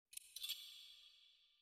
sword click; soundscrate

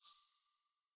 graphics high; soundscrate

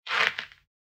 footfall gravel step; soundscrate

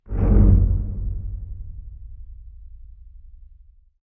Evil Low Bowing; SoundsCrate

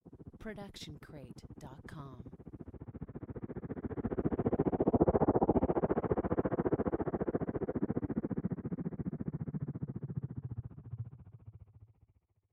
distant helicopter; SoundsCrate

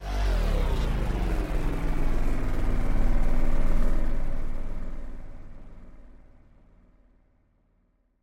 synth fall; soundscrate

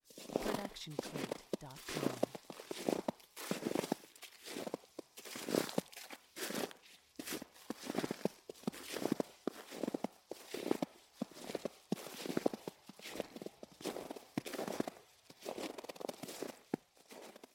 Snow Walking; SoundsCrate

